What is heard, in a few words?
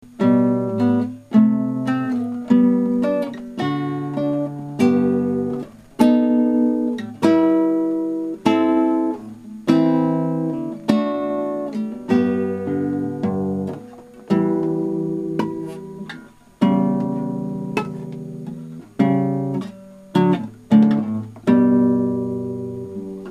guitar baroque composition